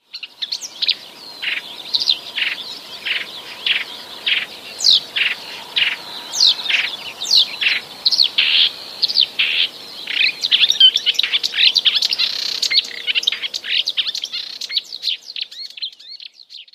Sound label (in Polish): lozowka, słychać głos trochę podobny do głosu sroki; wroblowe; zapozyczenia